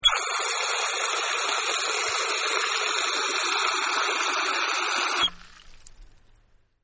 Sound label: Park Fountain, Drinking Fountain Streaming Water; Wildwood Park Drinking Fountain Streaming Water; Amusement Parks